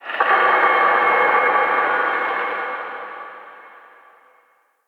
antique rattle distant; Ambience; Under City

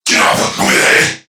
Surprised Crewmen; Warframe; CorpusCrewmen; CrewmanA

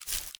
Grunt Foley Jump; Enemy; Characters; Corrupted Sproutfolk

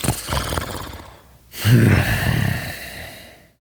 Snores, Father, Vocalizations; Warframe; Deimos

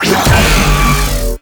Blast Cast; Warframe; Caliban

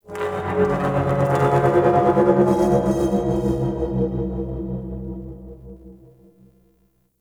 Metal Groans And Scrapes, Asteroid; Warframe; Grineer

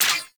Sentient Sniper Mech; Warframe

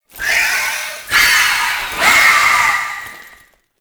Idle Agile Pistol; Warframe; Dagath

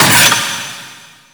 Vaults Stake Gate; Gameplay; Warframe; Entrati

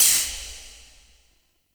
Corruption Pickup Despawn; Warframe; Missions; Zariman

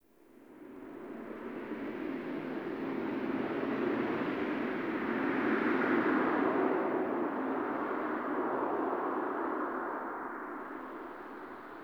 Dark Wind; Ambience; Warframe; Nineteen Ninety Nine

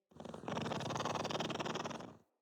Pet Purr; Enemies; Catbrow; Pet Ship

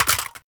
Pistol Reload Mag Drop; Warframe; Tenno; Lasrian

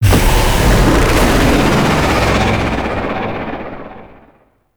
Void Dragon Transference Out Explosion; Enemies; Gameplay; Warframe; Duviri

